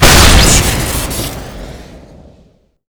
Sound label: rocket shoot crit; blackbox; garrysmod; tf2